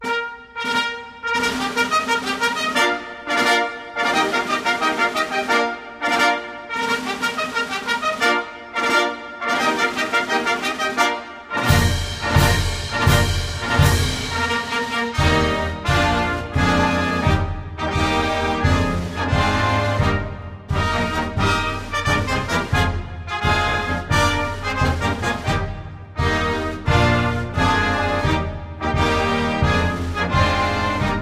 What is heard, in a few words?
anthem